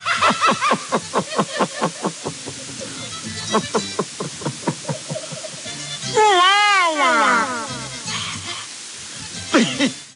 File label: hohoho